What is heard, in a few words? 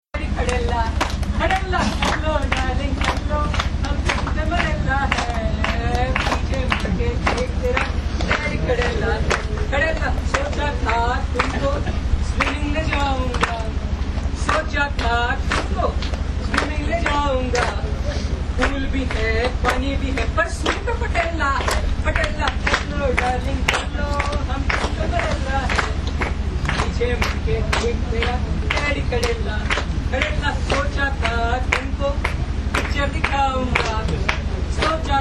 trekking song